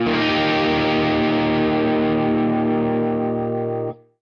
guitar chord; Summer Catchers

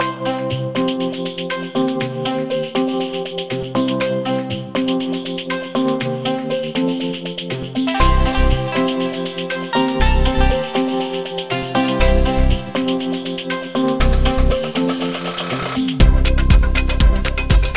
Music Briefing; Smart As...; PS Vita; Music Arithmetic - Briefing2

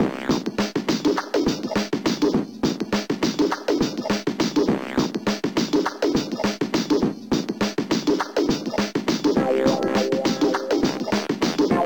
Level BGM; Titeuf Ze Gag Machine; GBA

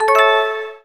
Medal; dash till puff 2; android ios

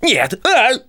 Drums; the princess and the frog; Twitch Drums

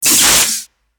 Spell Lightning ball Weak

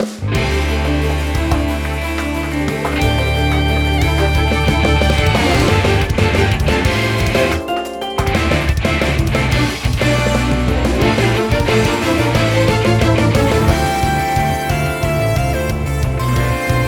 Music event; Music Game General; Jikkyou Powerful Pro Yakyuu 2016; PS Vita

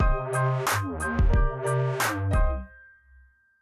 Action

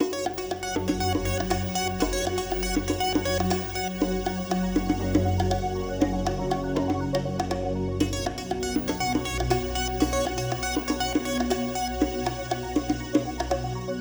BGM Matching; Super Mario Party Jamboree

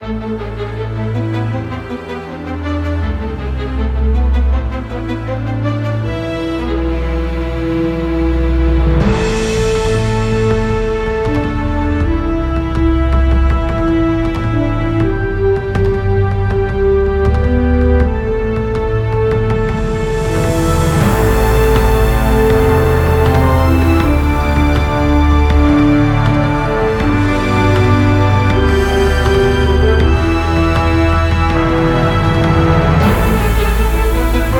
TENSION LOOPABLE

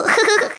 Sound giggle

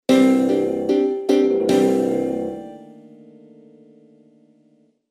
Sting; Die Hard Nakatomi Plaza

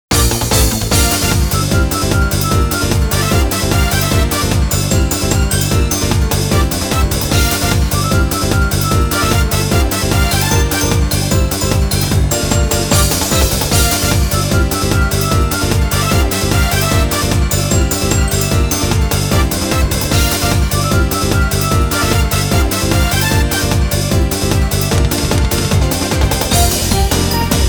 High-Speed Game; Jikkyo Pawafuru Puroyakyu; Pawapuro Ongakukan 3

